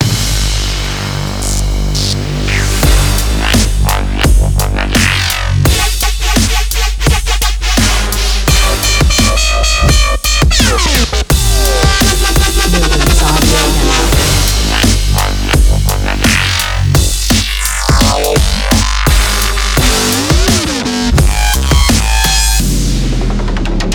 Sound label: serum bass